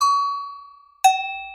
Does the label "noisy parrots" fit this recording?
No